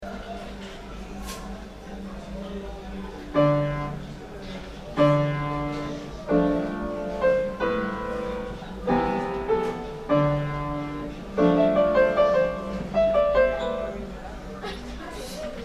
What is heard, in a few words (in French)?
son d ambiance piano